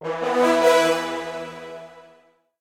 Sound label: Achievement Obtained